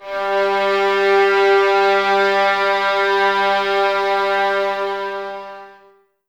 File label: Violins G 3, String Ensembles; Light; Miroslav Vitous; 11 Violins